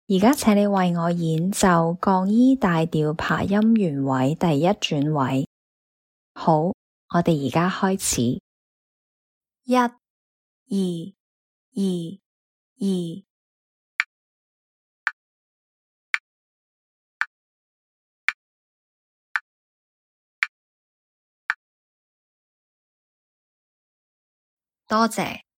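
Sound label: E flat major arpeggio first inversion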